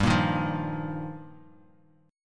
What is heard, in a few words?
G Minor 7th Sharp 5th, Bass Standard; Gm7#5; strummed; JGuitar; 15,13,13,10